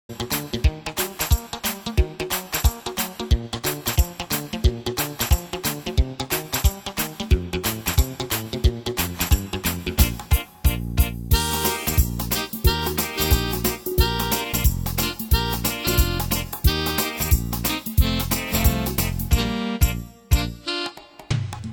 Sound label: guitar mambo